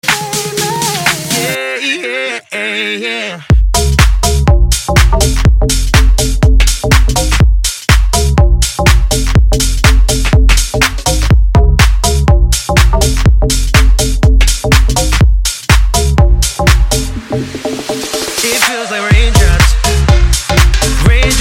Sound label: shuffle dance